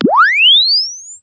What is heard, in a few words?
Press Two Sides Chirp; Samsung 4 Recordings